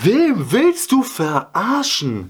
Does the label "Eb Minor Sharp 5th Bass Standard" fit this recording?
No